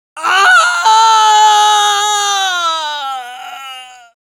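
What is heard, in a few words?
Scout pain critical death, es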